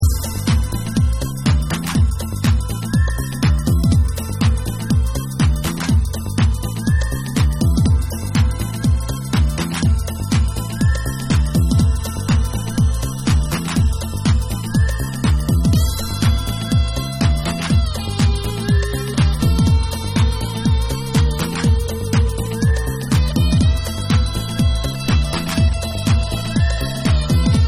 Safe Sax Instrumental

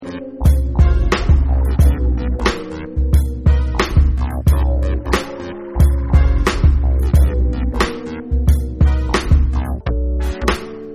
Instrumental

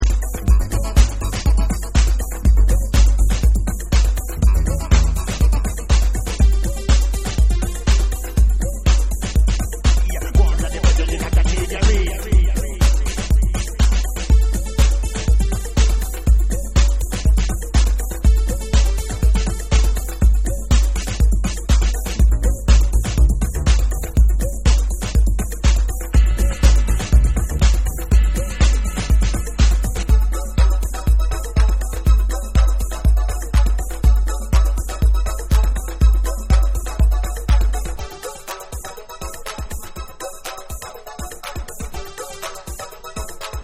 RAGGA HOUSE Dub Mix

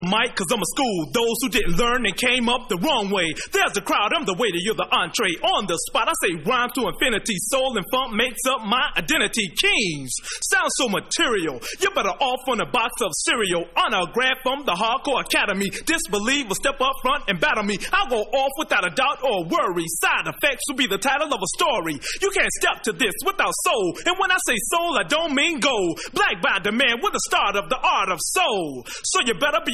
Acapella

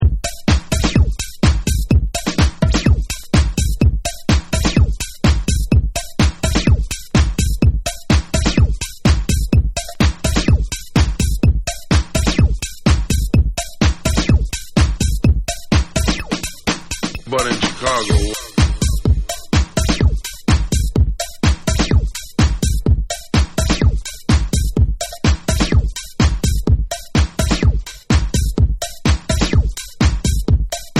BONUS BEATS

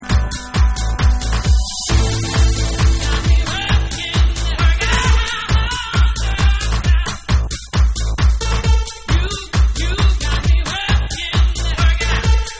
Tribal Dub Mix

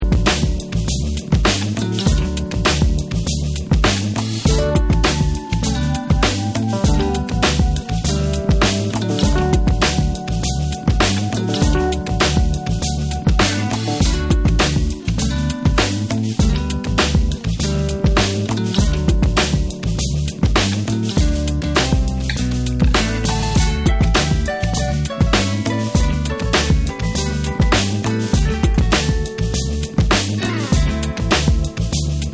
Instrumental

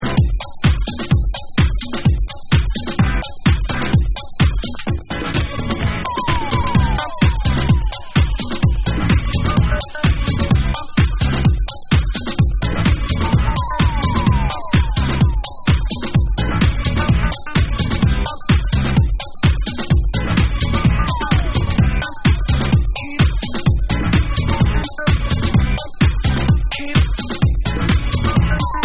Dub Mix

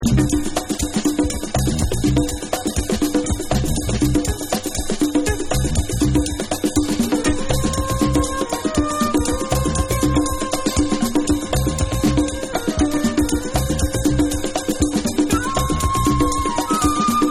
JAZZ Afro Beat

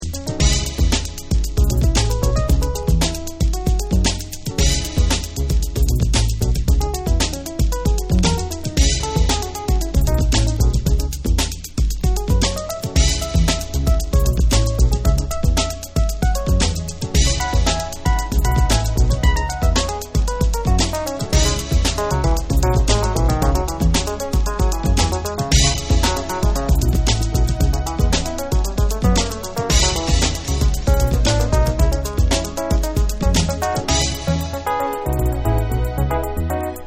JAZZ Jazz Dub

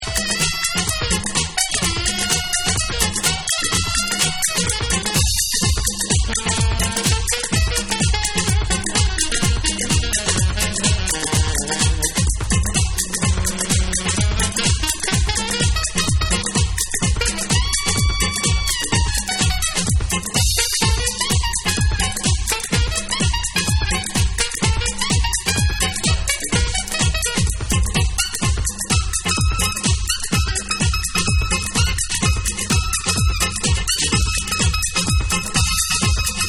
Alto Dub

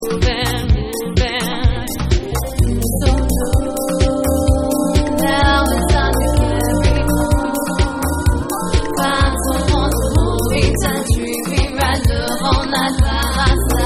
Vocal Mix

